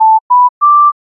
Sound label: timer beep